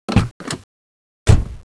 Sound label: car exit